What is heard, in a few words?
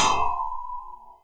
Fracture Hi Hat, Found Percussion Hits; UNUSUAL KITS and FX; ModeAudio